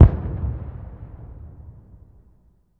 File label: Explosion Gasbag; War Ambience; Prydwen